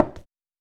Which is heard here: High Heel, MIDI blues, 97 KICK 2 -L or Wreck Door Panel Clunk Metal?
High Heel